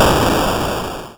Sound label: atari boom